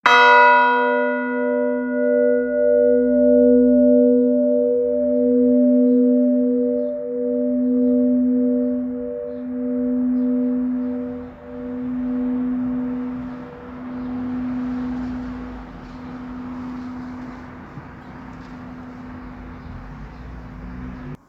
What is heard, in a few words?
lower beeding six, bells